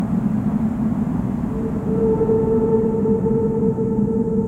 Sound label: eerie; Microgame It